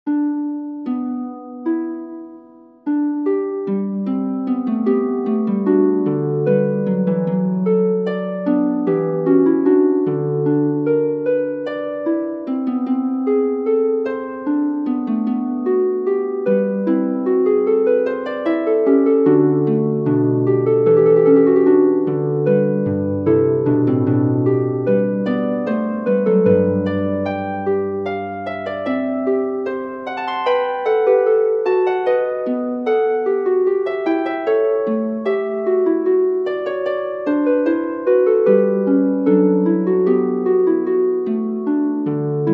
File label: fugue major for harp; bach johann sebastian